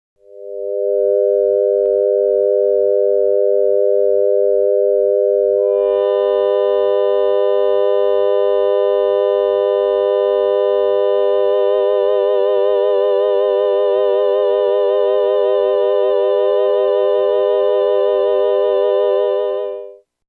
G, B, D, but different vibratos

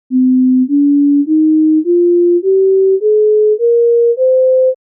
Equalized Scale

